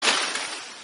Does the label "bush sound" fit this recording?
Yes